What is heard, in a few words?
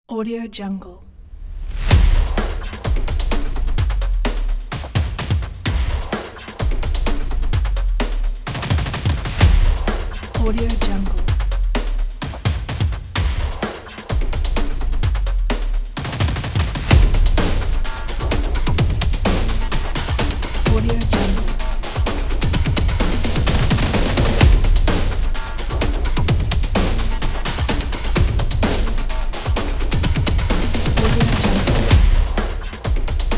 action powerful percussion